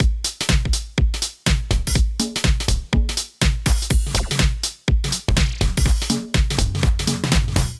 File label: Foundation Dance Kit 123 Bpm, Drums; MIDI Clips; Ableton; Factory Packs; Vibrations; ModeAudio